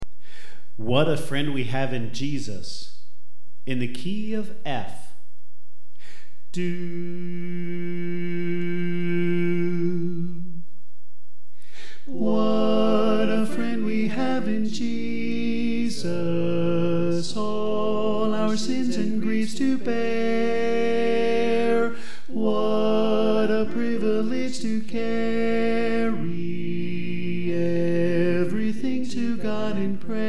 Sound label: Tenor